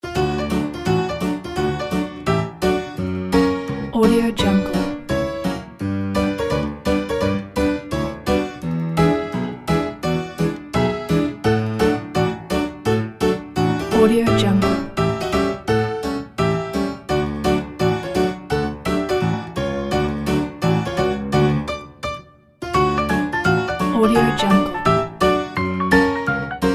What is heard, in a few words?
carefree ragtime loop